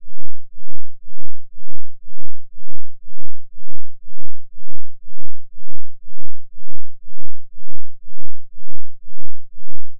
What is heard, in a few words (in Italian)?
Modulante 2Hz